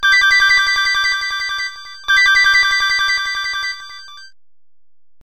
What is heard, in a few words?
phone ringing alarm ringtone